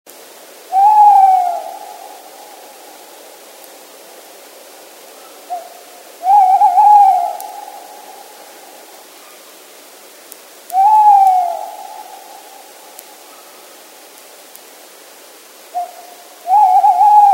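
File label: tawny owl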